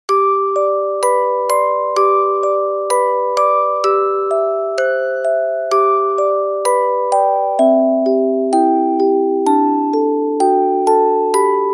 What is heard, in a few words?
orgel